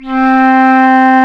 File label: flute, C flute